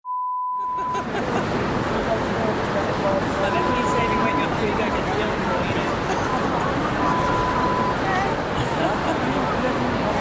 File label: Convention Ambience sound